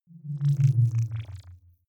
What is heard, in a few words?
wobble drop splutter low